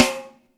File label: SN, KICK SN; AKAI S-Series CD-ROM Sound Library VOL-2